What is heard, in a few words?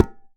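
Percussion Tabla; Drums; Acoustic and Electric; Polyend; Simulacrum Sounds; 1 Shots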